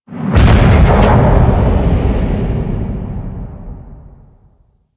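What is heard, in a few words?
Ship Distant Damage Explosion; Warframe; Corpus Ship Remaster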